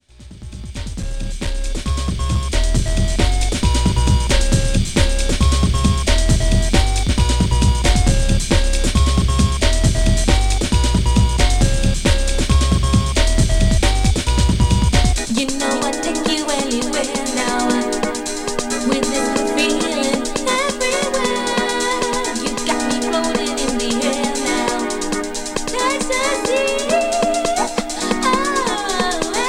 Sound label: basic rhythm neurosis; rivet